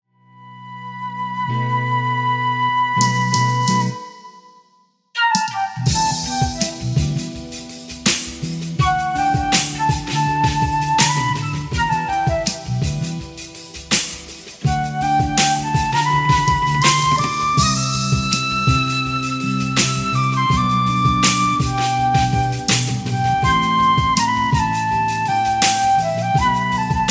flute

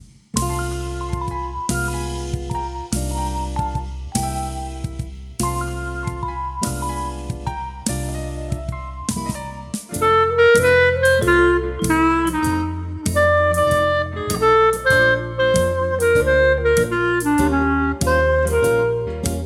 Clarinet

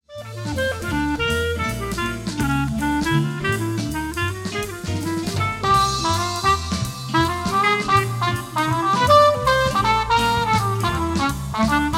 clarinet

